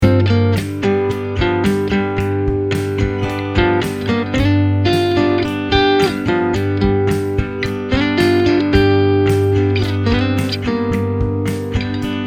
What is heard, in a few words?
blues loop